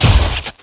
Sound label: arc emitter fire, weapons; Stellaris